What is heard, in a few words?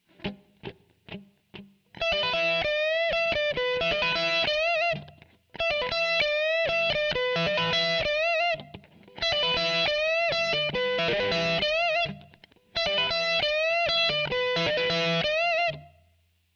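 Guitar Riff E minor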